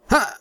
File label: Male Jump